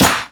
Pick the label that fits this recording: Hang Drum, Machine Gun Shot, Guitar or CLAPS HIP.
CLAPS HIP